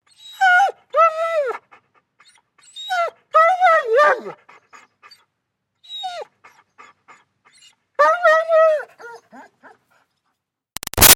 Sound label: Dog German Shepherd Whines Barks; Dogs; Animals